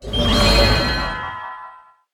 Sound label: dragon flying over reels with spreading wilds; koi princess